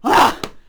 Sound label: cudgel attack; megaglest